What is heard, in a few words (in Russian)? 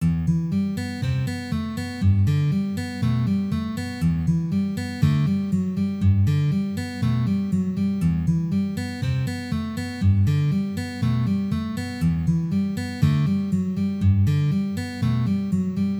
vstuplenie slozhnoe